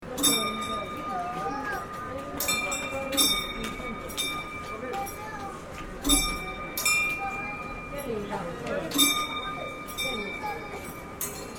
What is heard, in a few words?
phasing prayerwheels